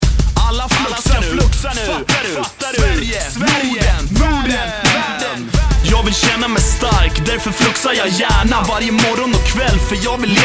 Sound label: hiphop